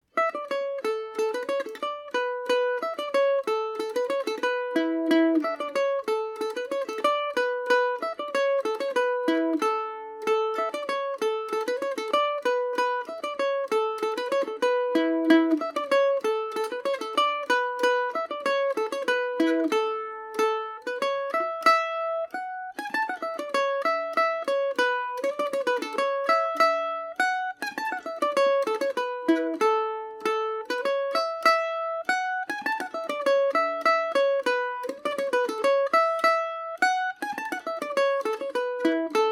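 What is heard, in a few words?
A major paris swing, irish mandolin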